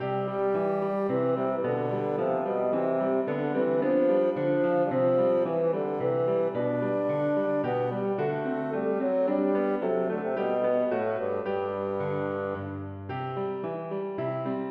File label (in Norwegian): Menue for fagott og piano